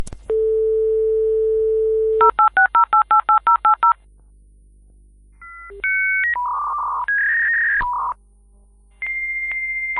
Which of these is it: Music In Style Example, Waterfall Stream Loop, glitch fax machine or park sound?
glitch fax machine